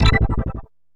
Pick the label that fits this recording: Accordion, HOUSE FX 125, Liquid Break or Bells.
HOUSE FX 125